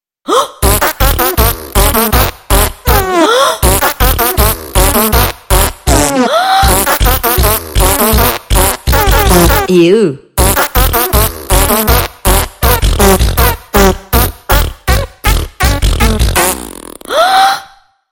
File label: fart song